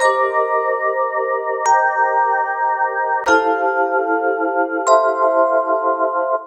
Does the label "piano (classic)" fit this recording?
Yes